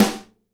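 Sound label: snare nylon damp, Live Snares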